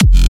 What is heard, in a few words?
Hard Kick Basss F